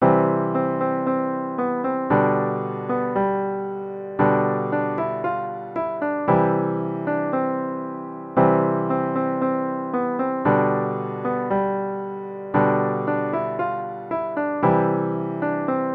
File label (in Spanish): Campanas de Belen Facil, piano; partituras navidad